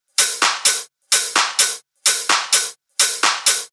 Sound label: 128 BPM